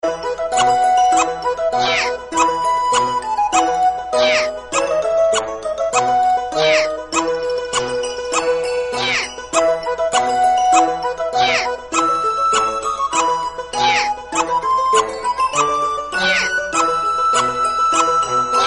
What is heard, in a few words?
Squeak; Orion